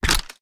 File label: reload empty fast rotate; gmod